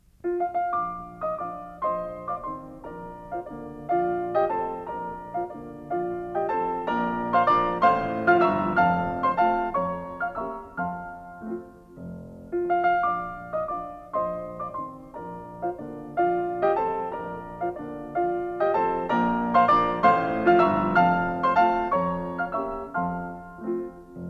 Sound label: Waltzes for piano, 4 hands (or piano), No. 8 in B-flat Major; Brahms